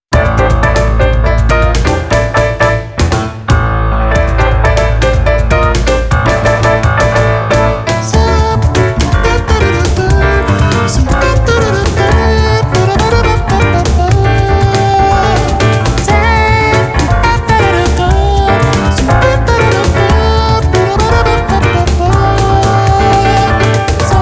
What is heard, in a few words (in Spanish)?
Jazz con Cajon